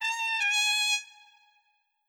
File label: Trumpet